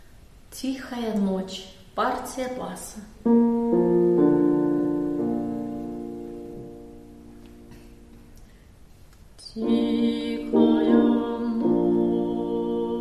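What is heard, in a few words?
bass; Tihaya noch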